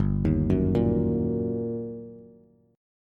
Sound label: G Minor Major 7th Sharp 5th, G, down, Bass; GmM7#5 arpeggiated; JGuitar